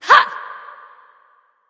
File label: grunt; Smite; athena